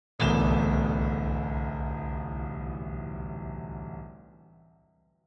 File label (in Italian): cluster accordo piano; Comporre musica da film: il genere Horror e Thriller